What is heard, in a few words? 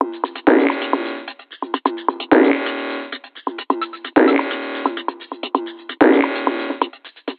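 Messup Loop, beats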